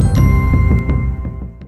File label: Mandos Bell